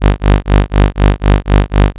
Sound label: amp Mod